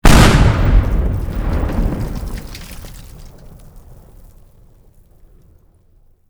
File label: explosion